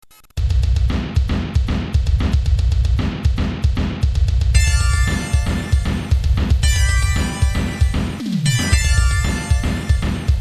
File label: inorganic beat; Darius